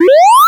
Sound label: jump classic; gameplay